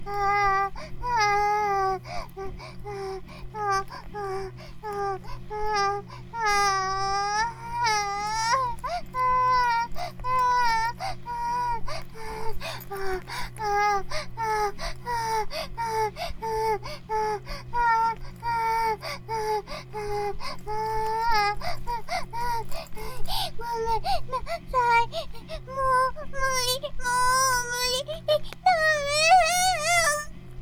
バイブ放置, ASMR

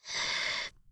ブレス ロング

